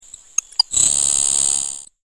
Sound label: Grasshopper Sparrow